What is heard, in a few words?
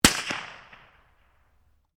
RIFLE CZ 452 VARMINT 22 CALIBRE FIRING; A selection of domesttic firearms; Rifles; Guns